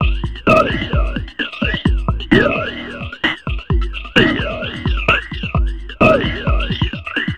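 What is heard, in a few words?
Messup Loop, beats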